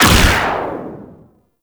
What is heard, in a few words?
TnT Shot; Warframe; Weapons; Archwing; Powers